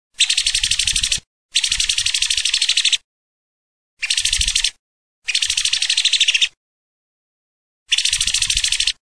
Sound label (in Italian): schiamazzo